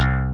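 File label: electric bass; ZG ELECBASS6; ZERO-G; Datafile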